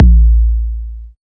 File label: KICK